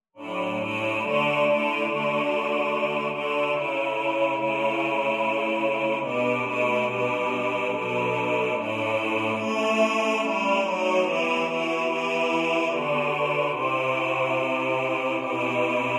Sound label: Ubi Caritas Bass